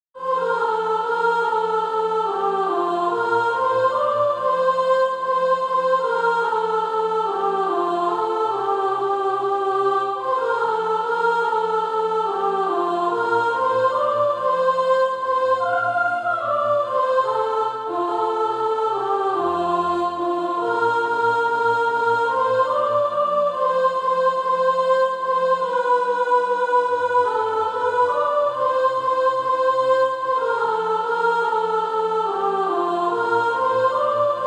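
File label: soprano, SATB Practise Music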